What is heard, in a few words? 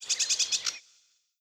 small bird; ambient; Garry's Mod; tf2; rottenburg